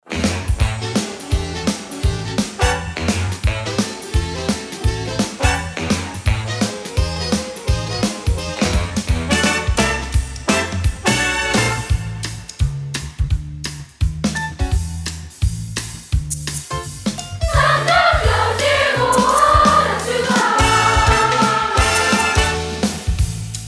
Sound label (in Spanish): Con Coro sin guitarra